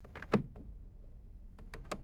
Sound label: plastic rearview mirror adjustment squeaks